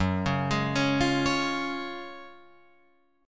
F sharp Major 7th Suspended 2nd, F sharp, down, Guitar, Open F; arpeggiated; JGuitar